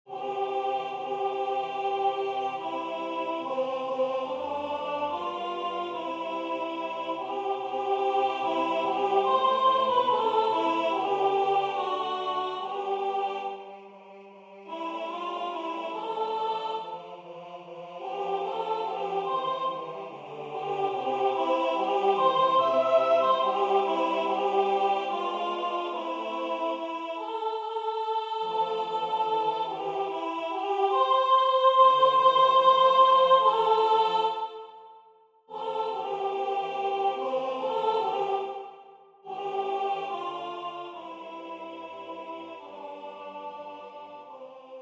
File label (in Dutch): sopraan